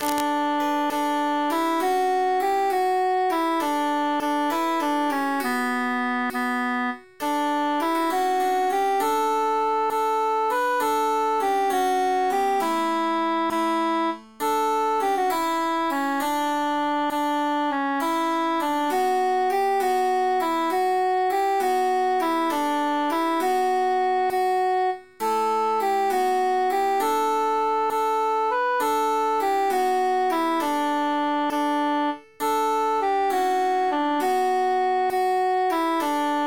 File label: transposed altus emphasized